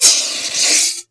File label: scrm